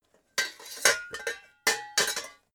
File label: pots handle